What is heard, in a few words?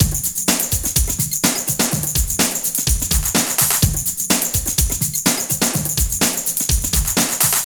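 drum loops, right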